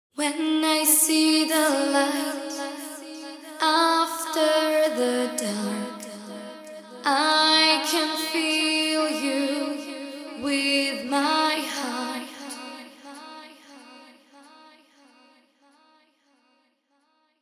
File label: VOCAL WET; Function Loops Vocals Bundle Vol 2